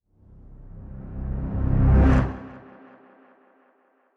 Orchestral hit rev

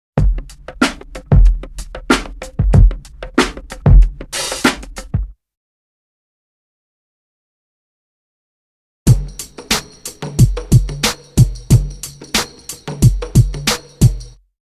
Drum loop; BEST OF DRUM BREAKS 30

